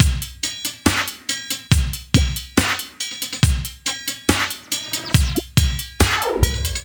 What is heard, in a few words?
SloMo Beats, Loops 140 BPM